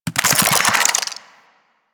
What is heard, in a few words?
Infested Big Ashen Melee End; Warframe